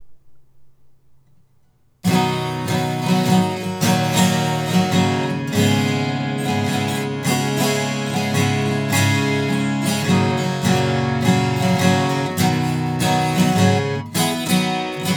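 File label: strum 12 left, hsguitar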